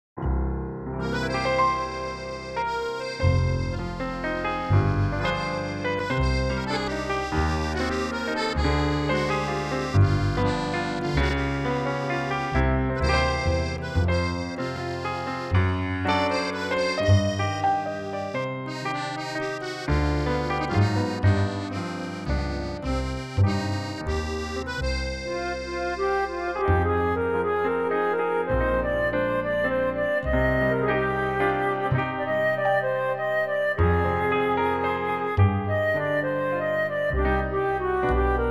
Cm play flute reference

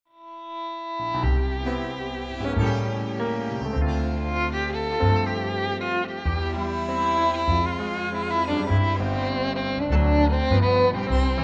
C

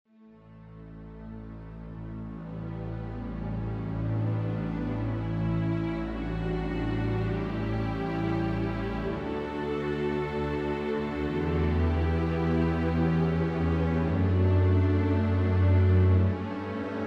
oboe D

